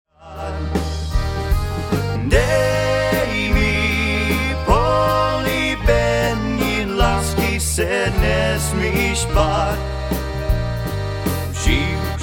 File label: Waltz